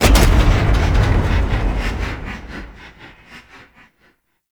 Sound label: Void Dragon Latch On; Enemies; Gameplay; Warframe; Duviri